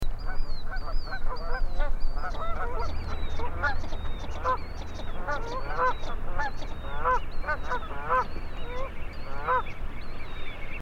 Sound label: Northern Shoveler Spatula clypeata; birds